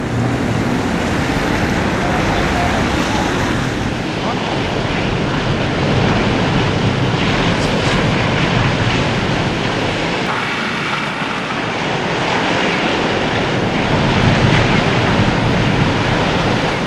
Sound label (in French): Blizzard, Vents